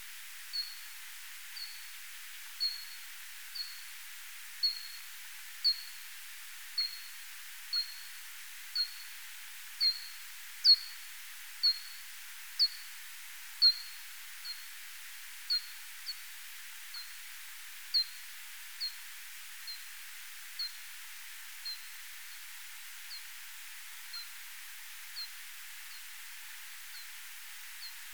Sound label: Pipistrellus abramus